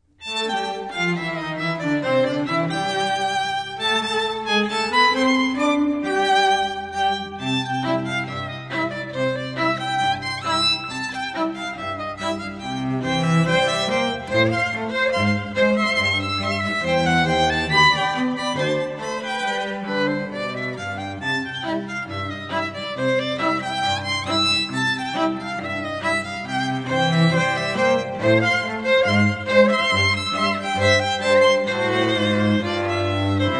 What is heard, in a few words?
String Trio